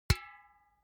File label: object can empty hit; smartsoundfx